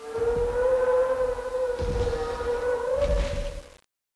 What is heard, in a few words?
dinosaurs snds; cstrike; bb raptors cage